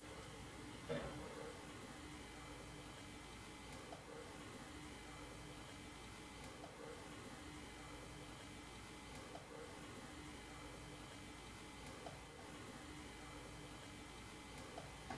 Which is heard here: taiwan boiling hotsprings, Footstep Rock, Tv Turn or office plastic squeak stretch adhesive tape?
Tv Turn